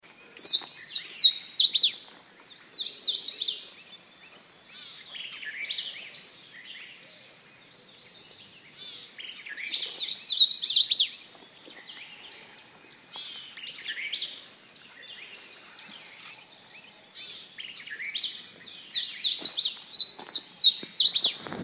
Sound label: Swainson's Warbler